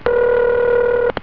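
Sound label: ring, telephone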